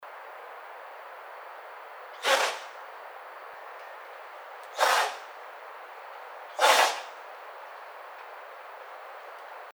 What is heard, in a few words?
White-tailed Deer